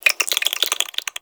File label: Infested Minion Attack, Vocals; Warframe